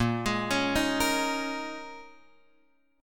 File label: Bb Minor 11th, down, Guitar, Open F; arpeggiated; JGuitar